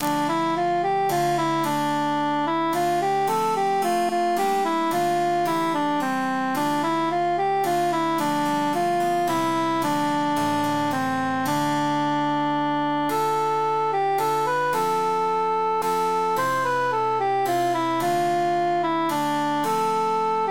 cantus emphasized; tourdion

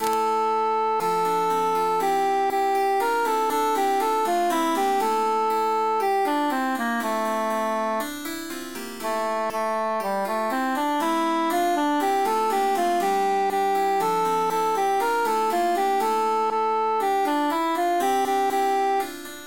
cantus emphasized; iacob scalam

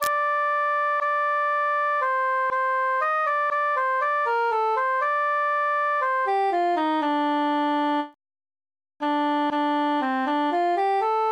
transposed cantus solo; iacob scalam

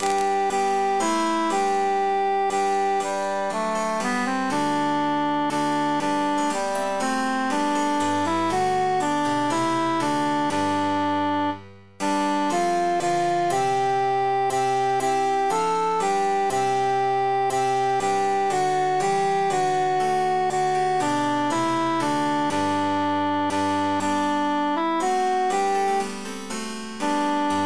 altus emphasized; sing we and chant it

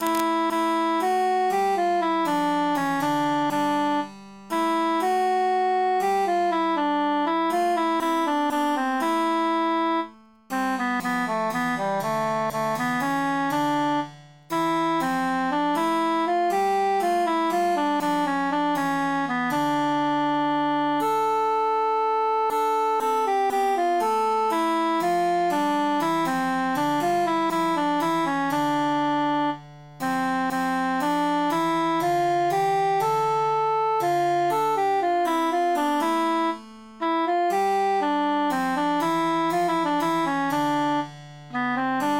cantus emphasized; la bionda trecca

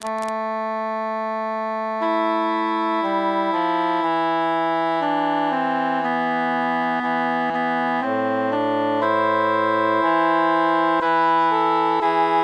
tenor mute; weep o mine eyes

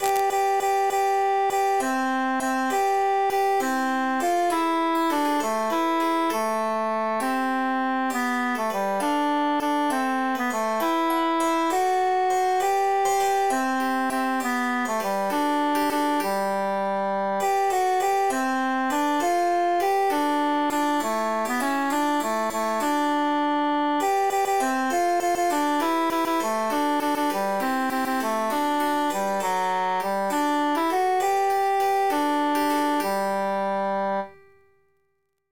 bassus emphasized; though philomela

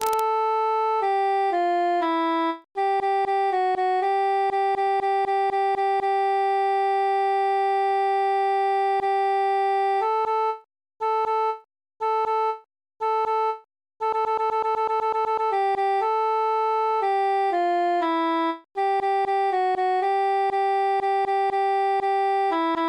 cantus solo; el grillo

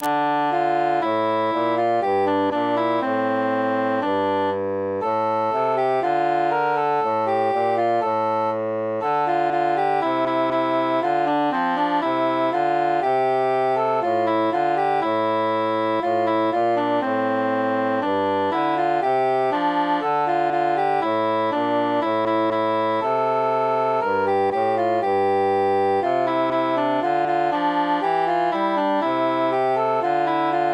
tenor mute; plaude

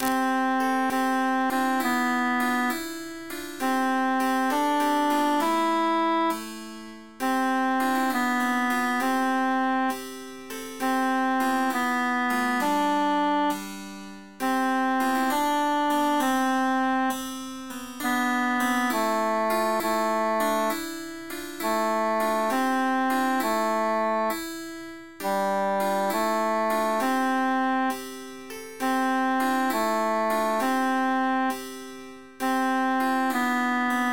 tenor emphasized

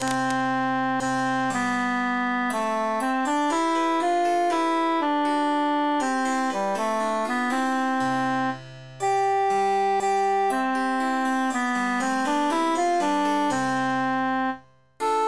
tenor emphasized; se la face

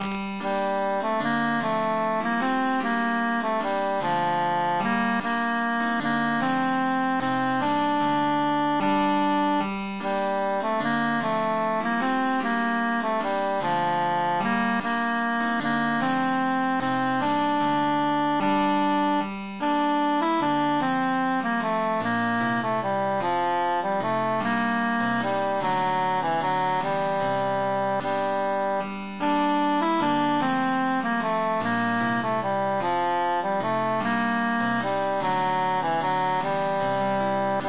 three country dances tenor emphasized

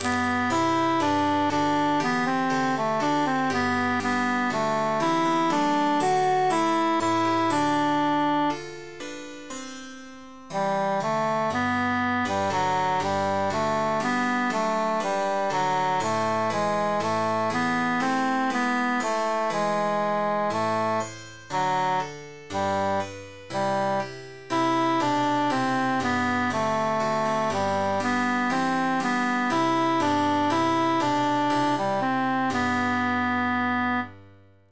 tenor emphasized

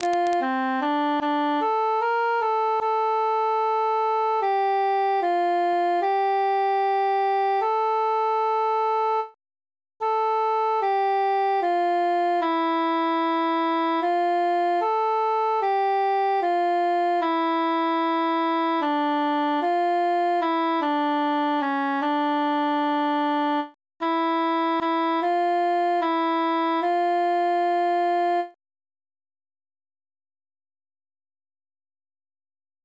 cantus solo; ave maria gallus

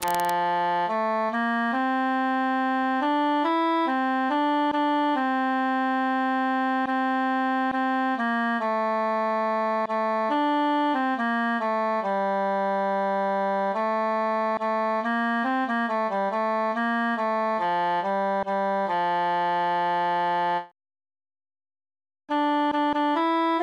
tenor solo; tant que vivray

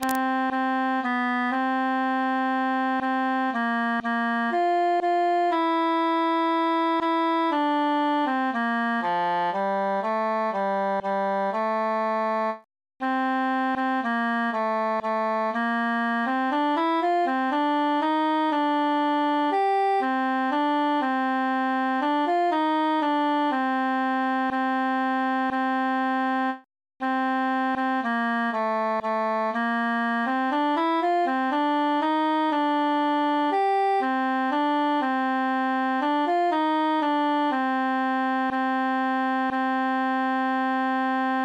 altus solo; silver swan

